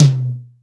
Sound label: Mid Tom